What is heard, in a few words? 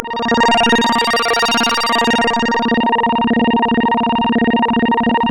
A#, Synclav FM; Big Fish Audio; SPACE1